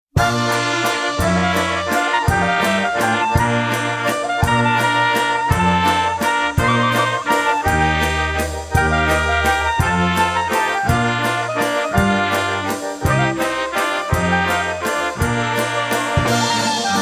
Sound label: Waltz